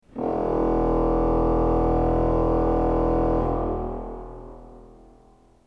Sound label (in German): ton, orgel